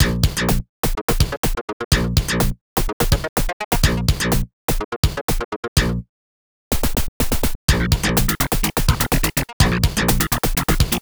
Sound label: Level Loading (Acid Trak); Zool Ninja of the Nth Dimension; Genesis